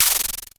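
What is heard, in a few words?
Klone Dual Percussion Synthesiser noise mod; KLONE FX NW8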